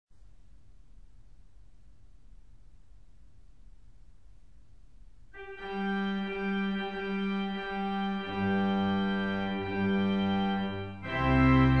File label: Bridal Chorus on the Pipe Organ; Wagner